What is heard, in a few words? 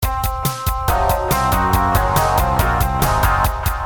depressing rock music